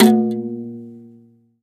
Badly tuned guitar; SFX; 7rystan's 600+ FREE EDM SAMPLES & DRUMS

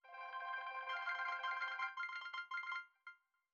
granular piano Cmaj; bespoke - Floral v.2

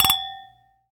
Glass clink; Foley; SFX; 7rystan's 600+ FREE EDM SAMPLES & DRUMS

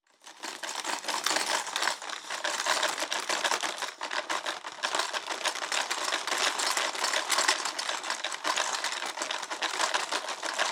foley; bespoke - Floral v.1

